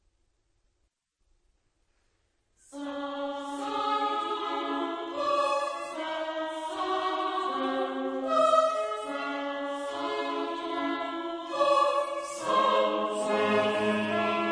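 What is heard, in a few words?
Missa brevis in D minor Sanctus; Mozart; KV65(61a)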